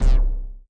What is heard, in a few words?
sfx score extramove; Ben 10 - Omnicode